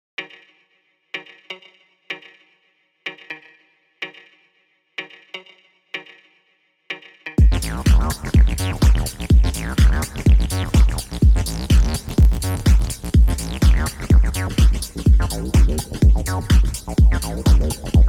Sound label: House